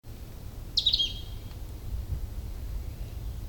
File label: Hume's treecreeper